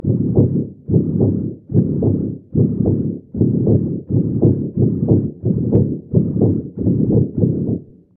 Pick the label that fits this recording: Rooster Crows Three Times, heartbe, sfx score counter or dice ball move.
heartbe